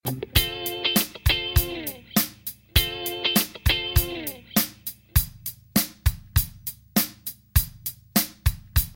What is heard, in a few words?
Instrumental